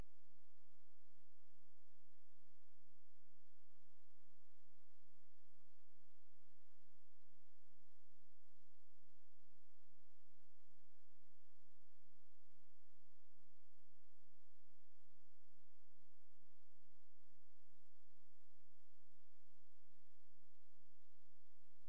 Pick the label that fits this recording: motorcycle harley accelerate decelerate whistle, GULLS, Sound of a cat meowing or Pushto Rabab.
Pushto Rabab